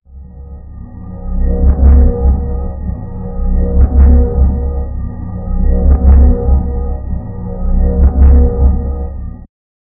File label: compressed drones with me, drones